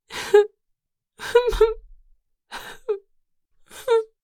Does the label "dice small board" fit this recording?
No